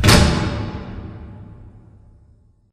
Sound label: cooling panel start; Titanfall 2